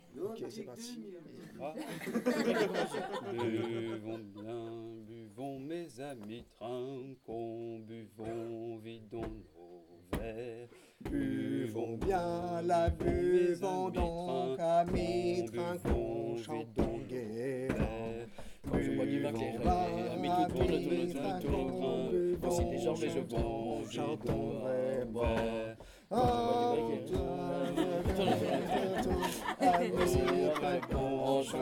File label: concert